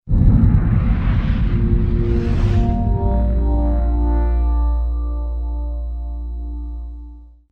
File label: Amd Logo Sound